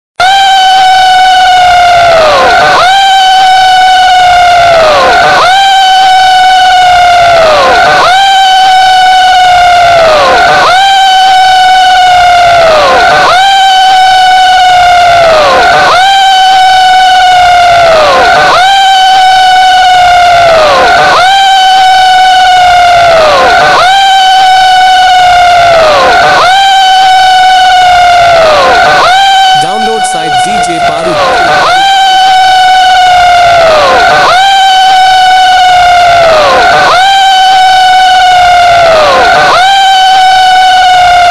Horror Sound Effect Horn Music; For Face To Face Compition

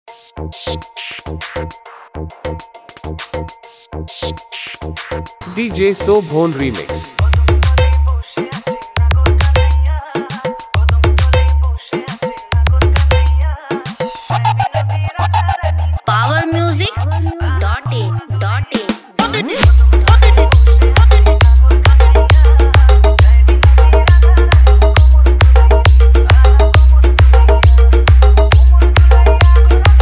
Bangla Folk Tending New Style Humbing Dance

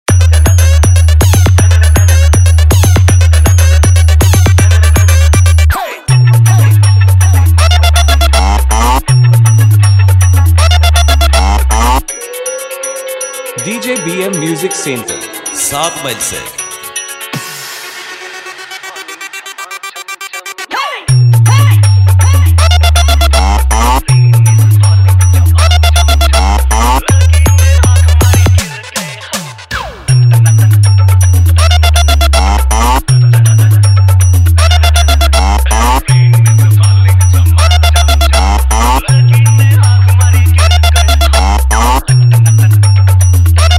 Cow Sound Long Humming Mix; Face To Face Competition; 1 Step; Dj Bm Music Center

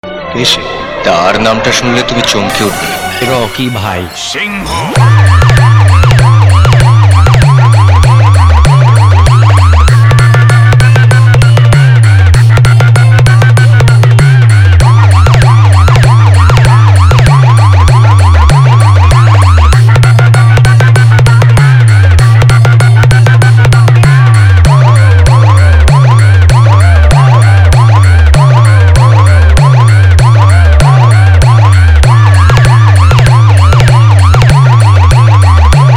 Ambulance Siren Music Runing Compitition Mix; Tapubaid Bankura

